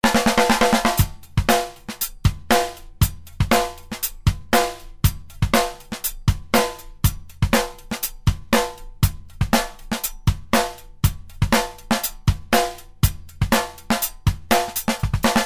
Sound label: Drum Groove